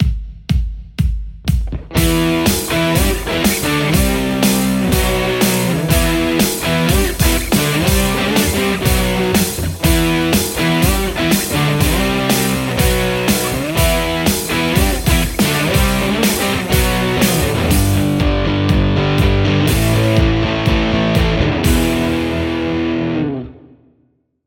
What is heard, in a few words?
white strat; poulin